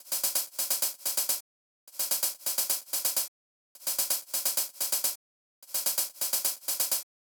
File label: Hat loop, Big EDM Drum Loops 128 BPM; Future House; Future House Tools